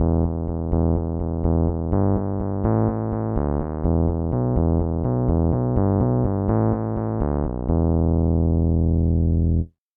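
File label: rhodes electric piano; bass; SpectMorph Evaluation #4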